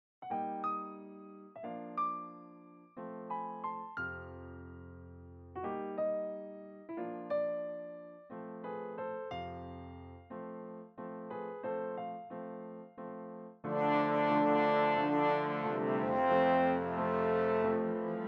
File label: Rain